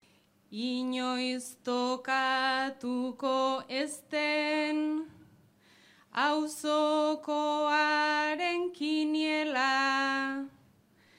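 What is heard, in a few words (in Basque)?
txapelduaren agurra; onati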